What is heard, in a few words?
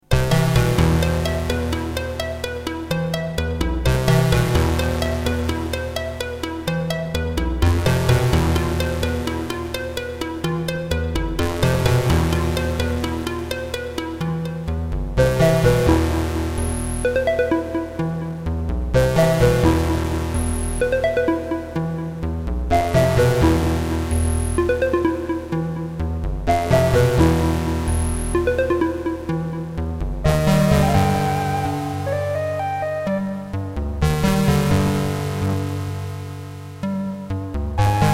Roland MT-32